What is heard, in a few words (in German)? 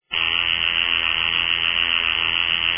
Wecker; gruen